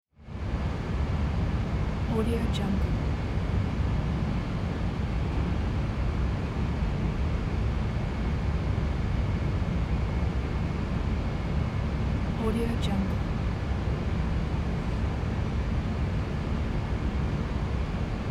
factory room tone

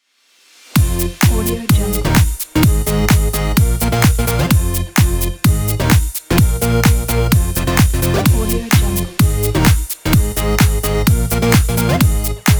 electro house ident

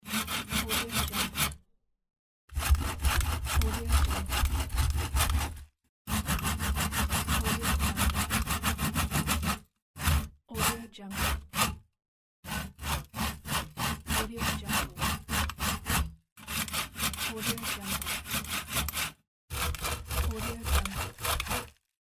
saw wood; tibasfx

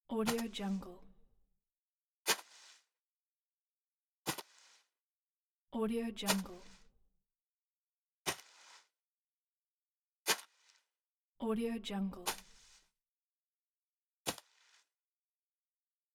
walk gingerly with dress shoes on the grass

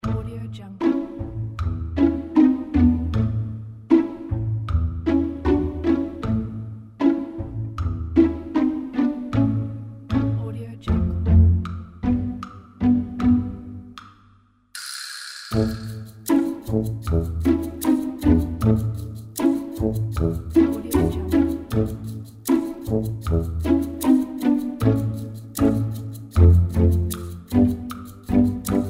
sneaky strings

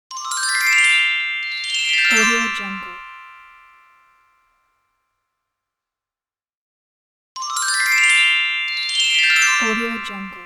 magical glockenspiel bells; applehillstudios

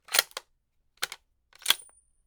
Tikka lite bolt with shell eject

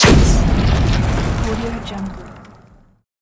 grenade explosion

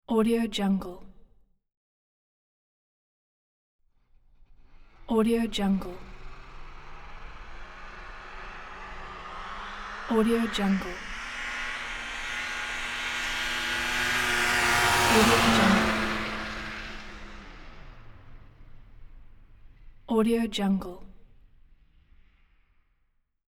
550cc snowmobile fast pass by

